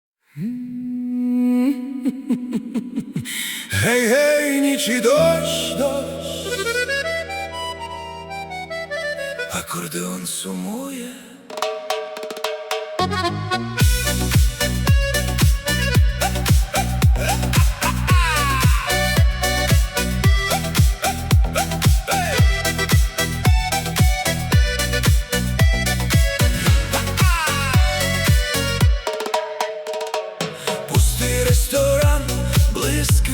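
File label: Ukrainian music for the car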